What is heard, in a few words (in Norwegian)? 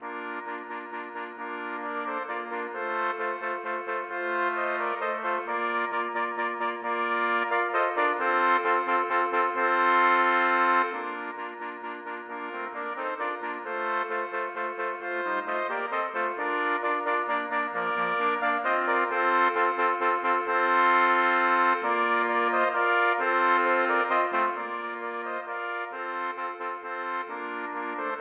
For fire trompeter